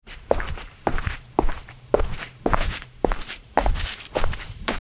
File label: sounds of footsteps walki, outdoor